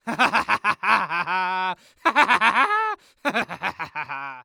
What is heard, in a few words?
Cartoon Human Male Evil Laugh; AdobeCC